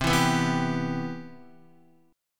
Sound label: C Major 7th, Guitar